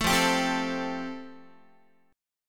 F Major Add 9th, Guitar; Fadd9 strummed; JGuitar

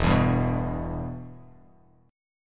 E-Minor, Bass Standard; strummed; JGuitar

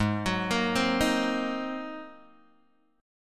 G sharp 9th, Guitar, Open G

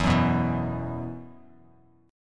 E Suspended 2nd, Esus2 chord; strummed; 12,9,9,9

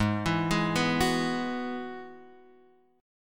Ab Minor 7th Sharp 5th, Abm7#5 chord; arpeggiated; JGuitar

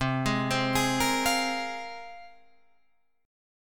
C 7th Suspended 4th Sharp 5th, Guitar, Open F; C7sus4#5 arpeggiated

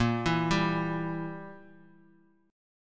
E Diminished A sharp

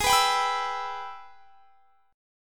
Minor Major 7th Sharp 5th; Gsharp; strummed; x,23,21,24,24,24; G#mM7#5

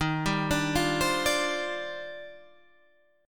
Eb Major 13th

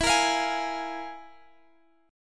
F Minor Major 7th Double Flat 5th, E

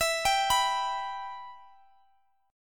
E Minor E down

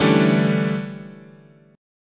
D Major 7th Suspended 2nd Suspended 4th, chord

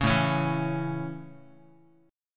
A Major A Guitar, chord; strummed; JGuitar; 4,5,4,2,x,x

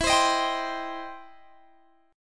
C Minor E; strummed; Cm/E

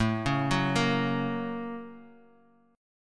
A sharp Minor Major 7th Sharp 5th

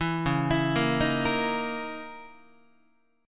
Db Minor 6th Add 9th Db down Guitar; arpeggiated; 7,9,7,8,7,9; 4A,4E,5A,5D,5G#,6C#

